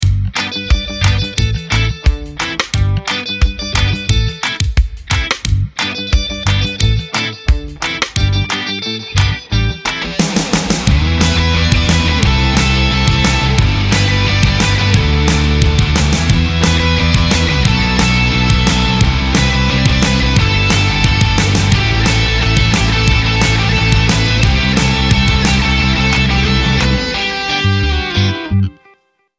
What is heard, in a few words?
EMO SKANK